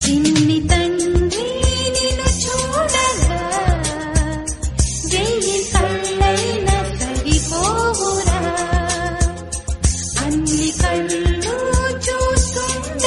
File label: telugu song; sisindri